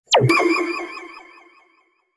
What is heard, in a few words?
sci fi button hover